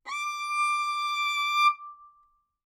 Violin arco ff sul A D6; MIS Pitches - 2014; Strings

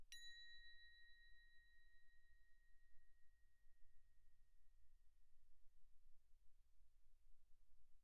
crotale Bb6 pp; Percussion; crotales

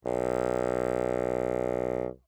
Bassoon ff B1; Woodwinds

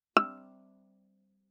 Viola pizz ff sul A E6; MIS Pitches - 2014; Strings

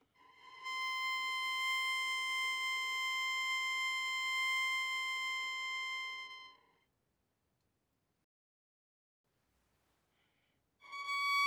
Violin arco mf sul E C6 Gb7

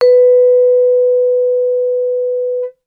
Vibraphone short sustain ff B4 stereo; MIS Pitches - 2014; Percussion

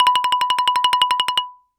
Xylophone hard rubber roll ff B5 stereo; MIS Pitches - 2014; Percussion

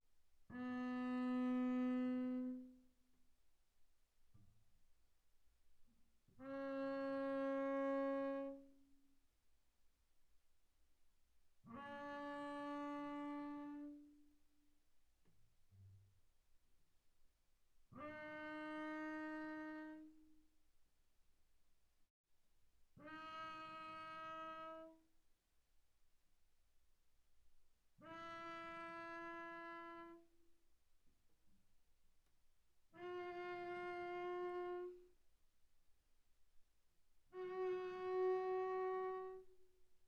Bass arco sul G pp B3 Gb4, double bass

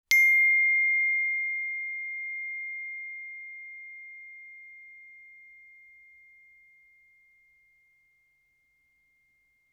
bells plastic ff C7 stereo; Percussion

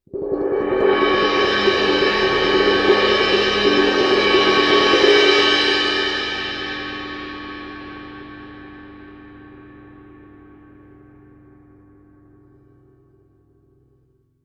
crash mallet roll, Suspended Cymbals; Percussion

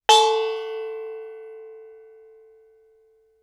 thai gong ff A4; MIS Pitches - 2014; Percussion

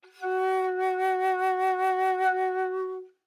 Alto Flute vib ff Gb4 stereo; Woodwinds

